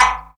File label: Percs